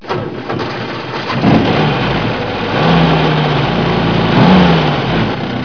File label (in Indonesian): suara mobil